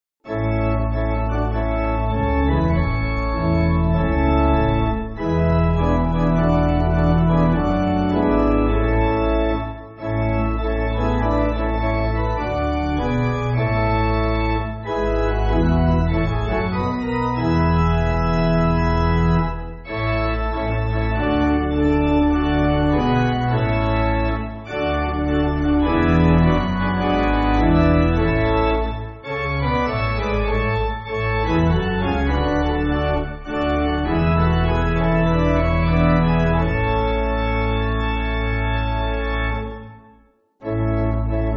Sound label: Pipe Organ, church music